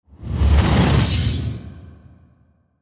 Whoosh Pass By Heavy Reverb; Blastwave

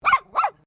Animal Dog Poodle Miniature Bark; Various domestic dogs; Animals

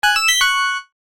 CHIME SYNTH MELODY; Chimes (Sound Effects:Multimedia)

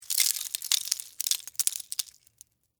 Texture, Debris, Spill, Sugar, Cone, Waffle; BlastWave

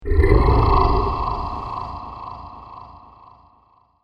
Growling Jungle Dinosaur; fantasy; Dinosaur Sound Effects

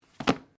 Book Place; Book movement; Offices

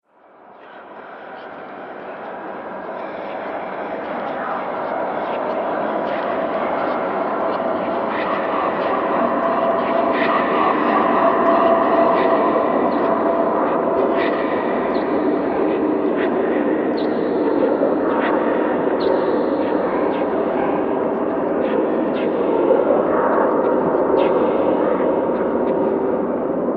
Dark Evil Underworld, Scary Soundscape

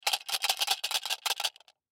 Tin Case Shake, Tin Case Shake Mint Candy Box; Shaking; Objects; Blastwave; Foley

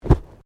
Body movement Fall Medium; Body movement foley to simulate falls and deaths; Wrestling And Struggling Sound Effects

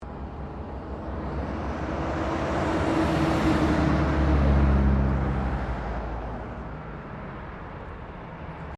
Traffic Semi Trailer Pass By; General traffic sounds; Traffic and Cars

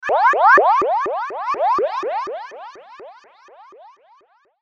Sweeper Bright Flange; Production Element Imaging Element Accent Transition

